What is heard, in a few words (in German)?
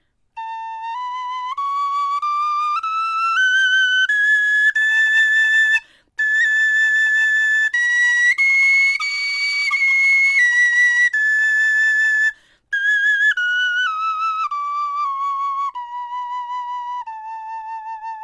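tonleiter, Knochenflöten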